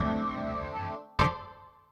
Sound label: SYNTH HOUSE 125 A, Keys; eJay